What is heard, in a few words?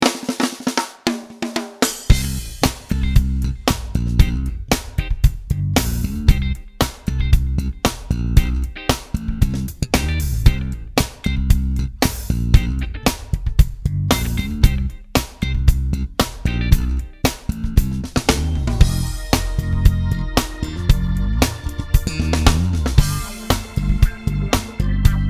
Backbeat 115; Coping